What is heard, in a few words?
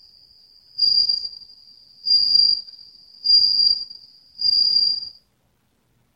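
bell cricket; arashiyama; suzumusi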